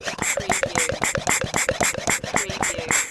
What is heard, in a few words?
Pesticide Pump Fast; Household Sounds / General Household